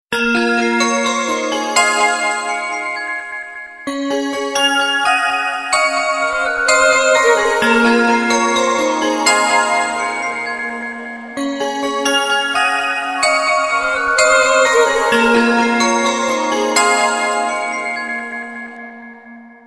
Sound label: tone; Valentine Tones